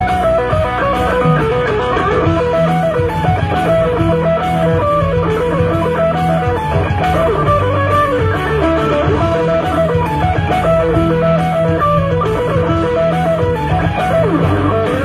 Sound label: guitar